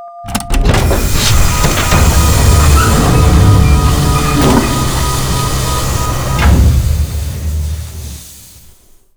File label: board from access tube, ships